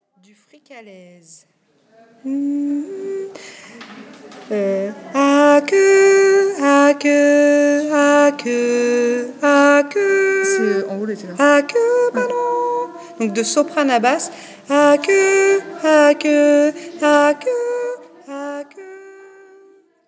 notes de départ

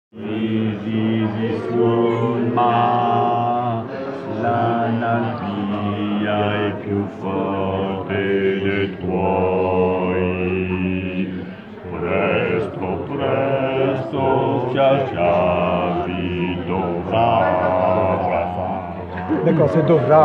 voix basse

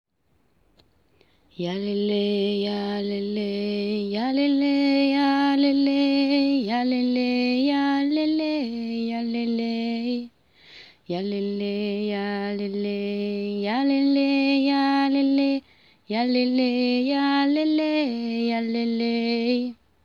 Voix intermediaire

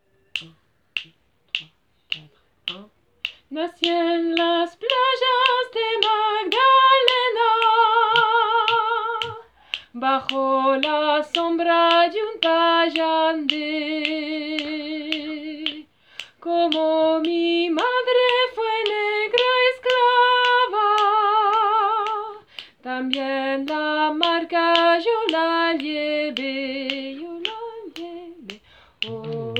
voix haute

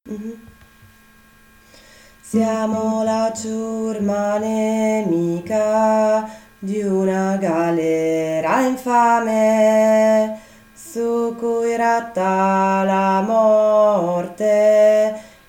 4 tenori

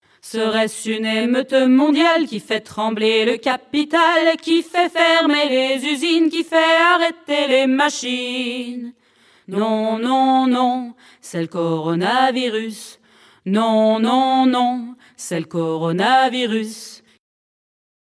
VOIX LEAD alti

